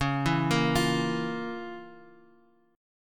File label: F Major 7th Suspended 4th Sharp 5th Db, guitar chord